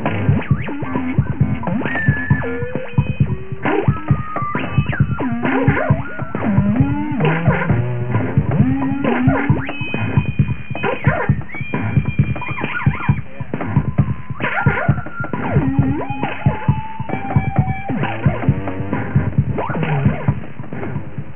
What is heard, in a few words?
fart